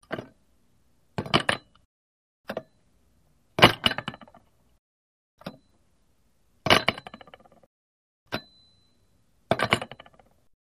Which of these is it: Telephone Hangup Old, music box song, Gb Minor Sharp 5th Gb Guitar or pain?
Telephone Hangup Old